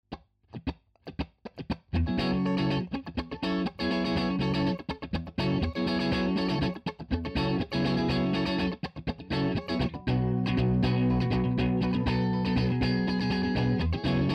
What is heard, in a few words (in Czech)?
Kytara clean Funky Voodo VR 1 Pasive